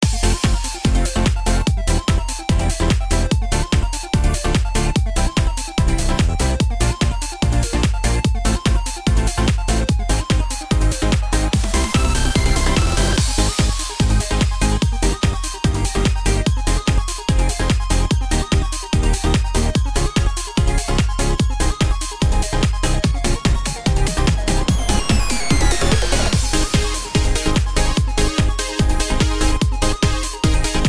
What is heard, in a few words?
BGM